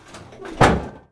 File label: Vehicle Hatches; Red Orchestra Ostfront 41-45